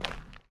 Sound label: step gravel